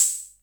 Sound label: HA64 HHO, Hammond Auto-Vari64